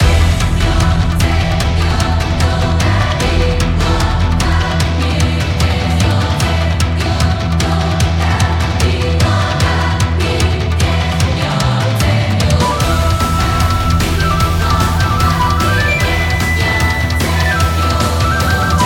Epic Battle loop

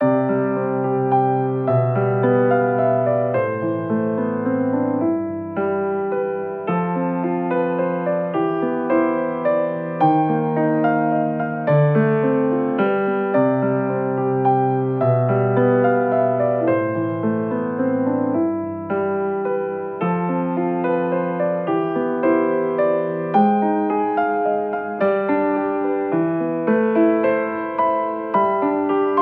Memories Piano loop

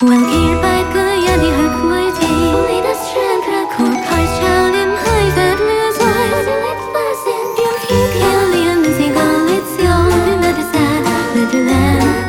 Gothic Cute loop

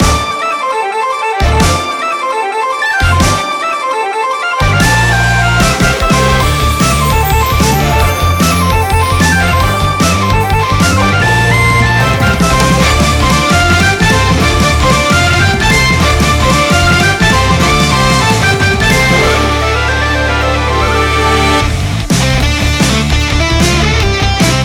Raid Folk Metal loop

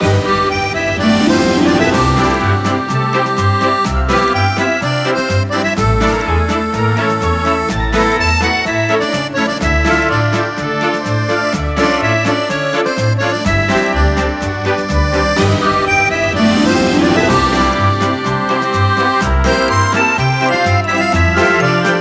Retro Roman City loop

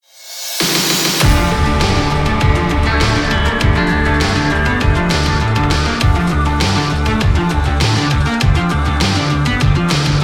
Cyber Noir loop

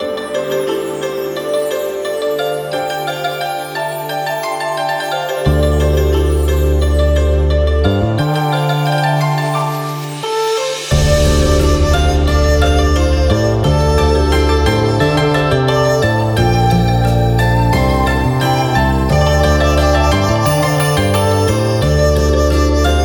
Chinatown Healing loop

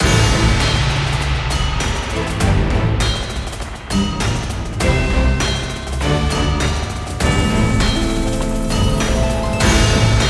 Steam Fortress loop

